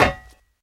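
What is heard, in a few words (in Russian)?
одиночный удар по железной крышке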